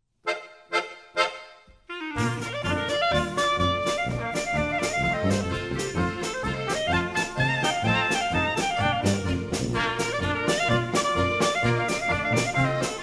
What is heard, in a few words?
CLARINET POLKA